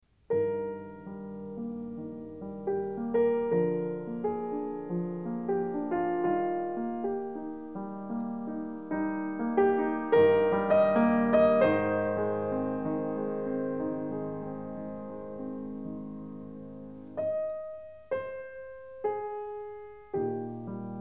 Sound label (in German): klavierstueck in es dur